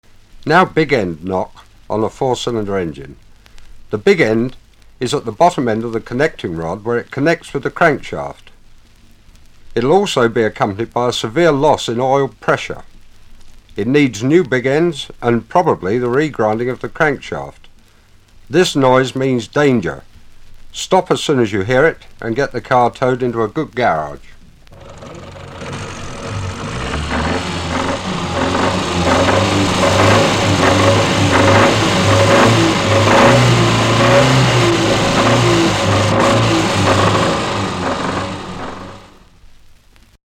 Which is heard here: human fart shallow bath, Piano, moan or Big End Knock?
Big End Knock